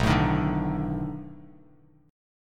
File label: B 7th Sharp 9th, down, Bass Standard; strummed